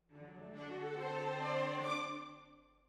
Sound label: Hall